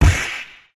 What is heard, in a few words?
punch high